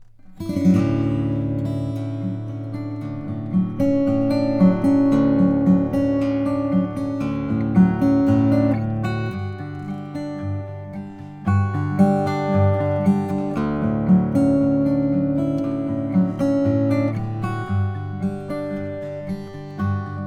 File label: schwartz oracle indian rosewood